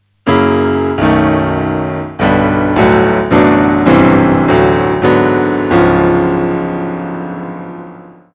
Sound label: chord sequence; Satie; Sarabande 3